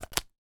food sweets smarties chocolate shells tube lid put on